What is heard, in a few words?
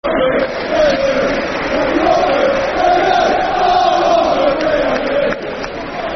We Love You Watford, Football Chant (Soccer Song)